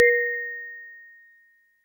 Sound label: Perc, Clavia Micro-Modular